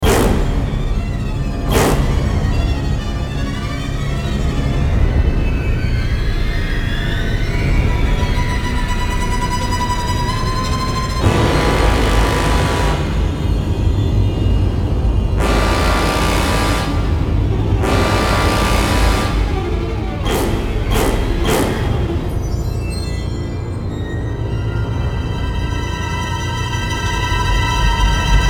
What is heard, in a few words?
Horror sound